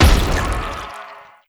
Explosion Wet; gamerip; Hollow Knight

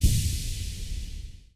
Focus Health Heal

